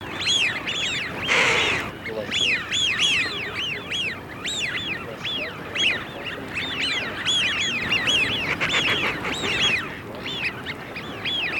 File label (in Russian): Свиязь Fischione; plurifon